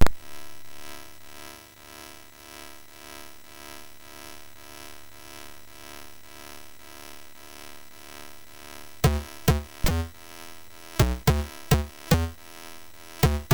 Groove sid MOS6581R3; hvsc

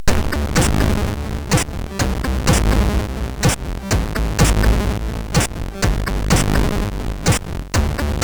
loop, SID CSG8580R5; Shitloop 3

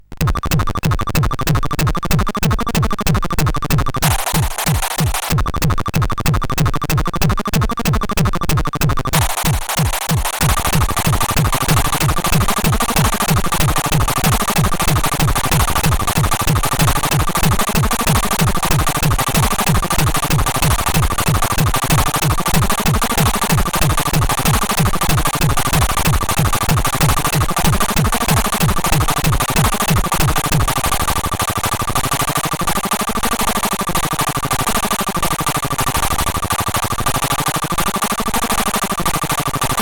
Grasbrenner Tune, CSG8580R5

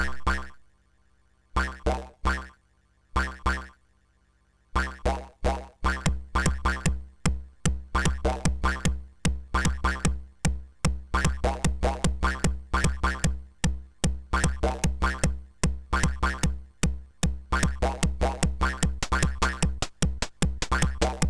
Boing, MOS6581R3

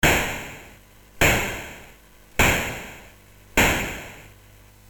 Shot, Commodore MOS6581R4; M7 Shot BASIC

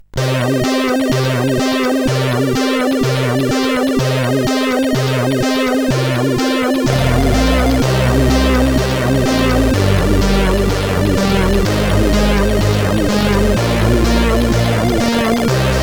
Music sid CSG8580R5

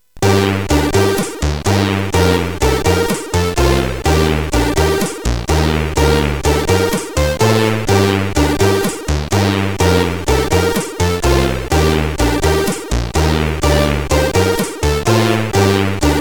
Sad Song, CSG8580R5

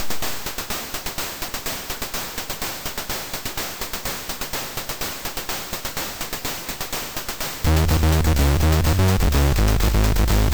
Housemix sid MOS6581R2

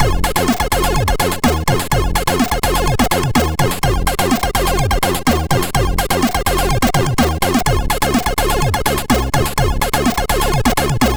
Small Intro, MOS6581R2; hvsc

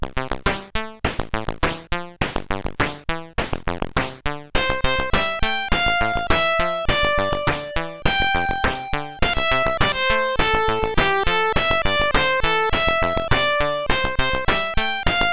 Super Synth Sample, sid CSG8580R5

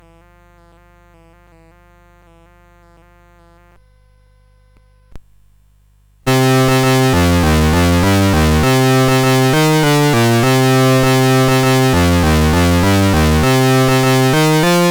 Castle Theme, CSG8580R5; Lord Myrkul; Ultima IV

